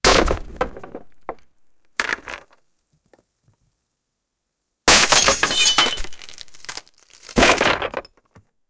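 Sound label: giant wooden crate breaking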